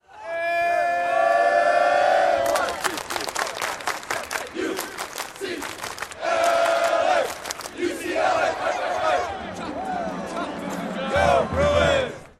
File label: clap + drums; basketball student section; uclas